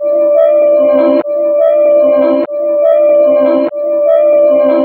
Ancient Music FX, Industrial

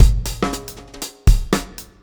Shuffle Beat

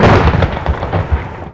THUNDER; Best Service - XXL Production Effects

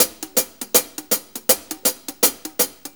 Shuffle HiHats; Sonic Foundry (Sony Creative Software); Drum Tools; Shuffles; HiHat Loops

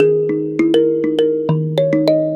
Mbira; Melodic; Ambient-Melodics-II

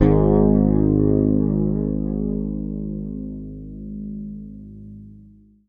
G#, Bass; Sampleheads; Will Lee Bass Library

